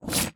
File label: Skill Slash; Magic Shrek